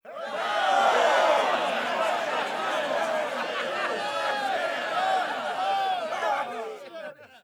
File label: Big Cheer